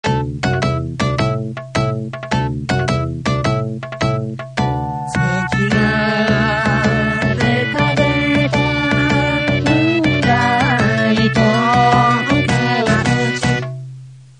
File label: jingle